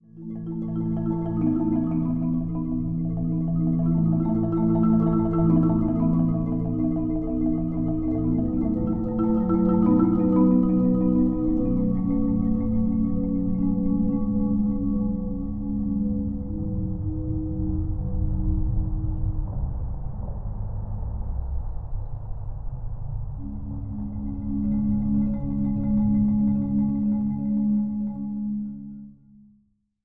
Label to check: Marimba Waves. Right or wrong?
Right